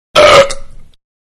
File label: Burp Disgusting; Burping; Human; Manhattan Production Music